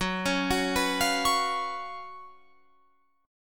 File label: Gb Major 7th Suspended 4th Gb Guitar Open F